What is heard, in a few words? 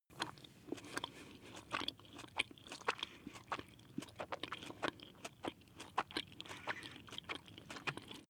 chew jellybaby male